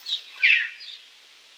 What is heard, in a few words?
Birds Various, Birds